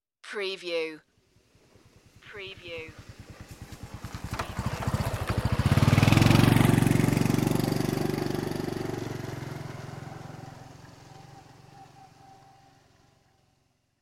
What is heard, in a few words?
motorcycle passing